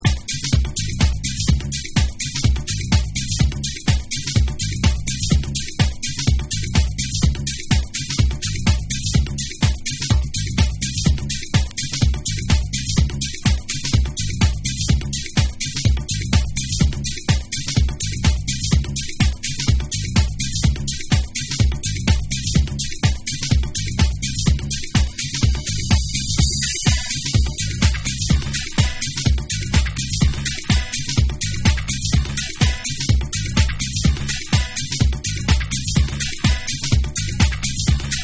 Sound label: Dub Mix